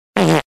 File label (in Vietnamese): Tieng dich ngan